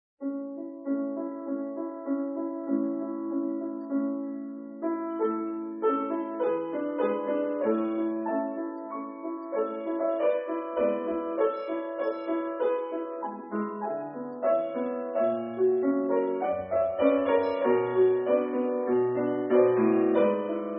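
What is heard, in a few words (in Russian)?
Дует из оперы; Дует Прилепы и Миловзора из оперы П. Чайковского "Пиковая дама"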